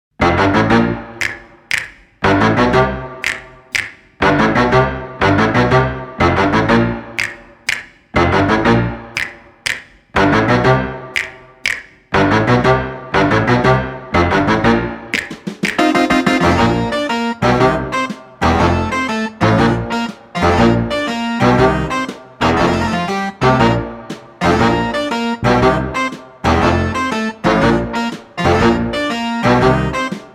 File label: Instrumental Backing Track; BMG Production Music